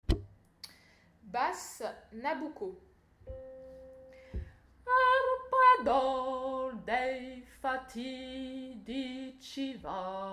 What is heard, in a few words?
basses nabucco